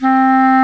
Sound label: Clarinet & Bass Clarinet